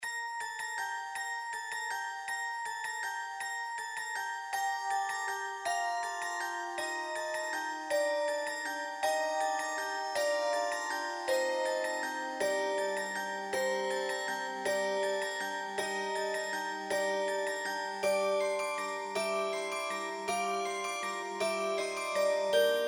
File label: Carol of the Bells Instrumental